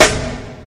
Royality free snare drum sound tuned to the D# note. Loudest frequency: 2633Hz; reverb snare d sharp key; snare drum samples